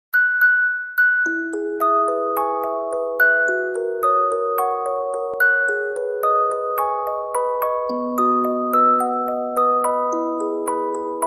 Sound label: music box ringtone; haikyuu